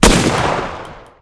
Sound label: Sniper tower attack